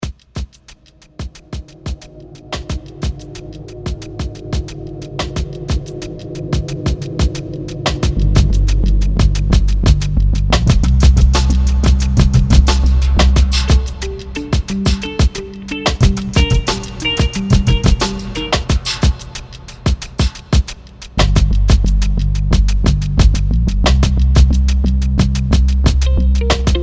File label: Guitar-less